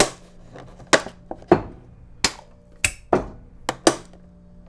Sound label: Good Snap Thunk, kitchen